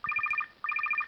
motorola razr v3 ringtones continental

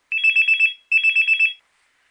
nokia3100 ringtone

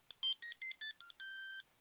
ericsson t39 ringtones

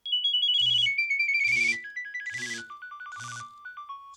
nokia 6100 ringtones; pin pin

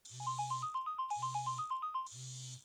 nokia 6100 ringtones hunt

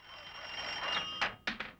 hand crank wall phone ring

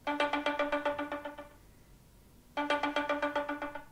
apple iphone 5 cosmic ringtone

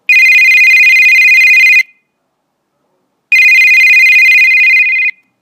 motorola international 3200, ringtones

